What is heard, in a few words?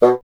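wind bassoon B; Miroslav Vitous - Solo Instruments VOL-1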